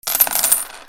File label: MONEY